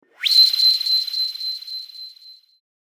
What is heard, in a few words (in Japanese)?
シャキン 輝き